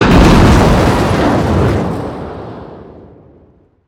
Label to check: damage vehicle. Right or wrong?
Right